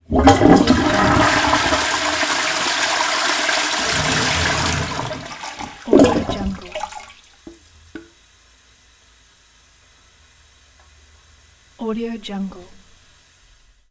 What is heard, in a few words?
toilet flush